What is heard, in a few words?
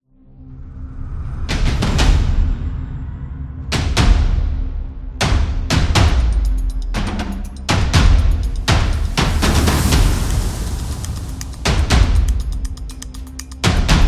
Epic Trailer Drums